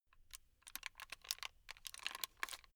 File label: plastic rattle slow short